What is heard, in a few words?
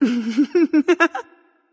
laugh light; smite; voicepack; hel